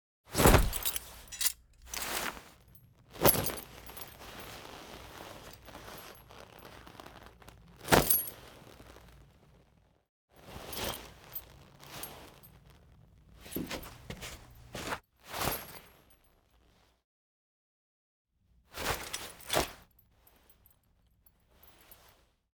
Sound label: Foley; Drifter; Warframe; Cinematics; Duviri Quest; Courtyard